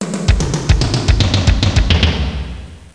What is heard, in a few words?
tom fill; wizard